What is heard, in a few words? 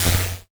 Charged Particles